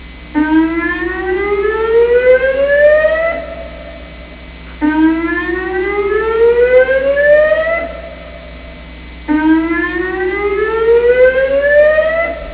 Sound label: Siren, police; CSEPP